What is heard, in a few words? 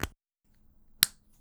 Thicc Tip Cap; bb - Books, Paper, Writing